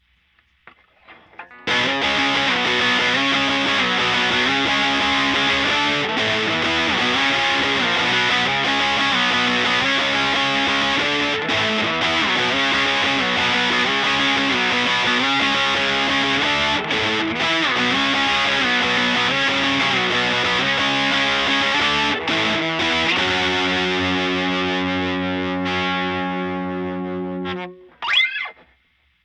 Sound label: Fender Twin Reverb dis